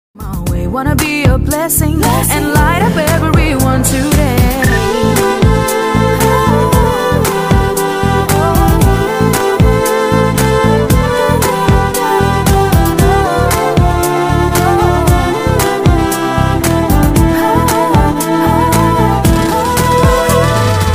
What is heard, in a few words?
instrumental ringtone swag beats